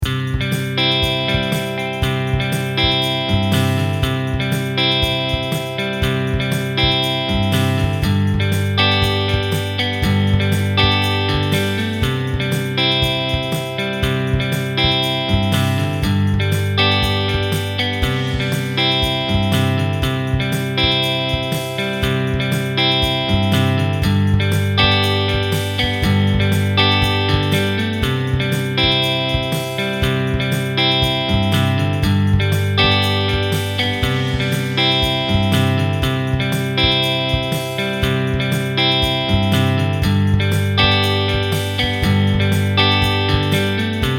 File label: No Melody, ukulele; Rainbow Ukulele